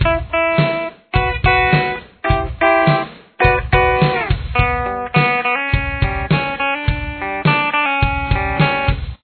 verse 2 guitar

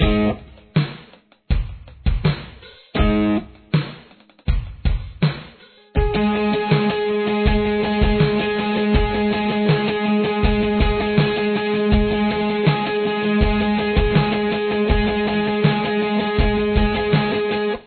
startstop guitar

